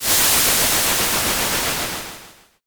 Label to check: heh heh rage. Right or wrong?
Wrong